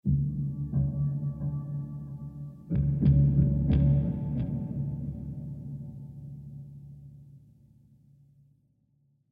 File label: Lap Steel Rhythmic